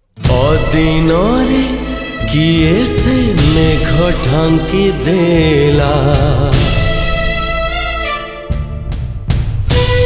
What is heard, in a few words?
Sad Version, Odia Song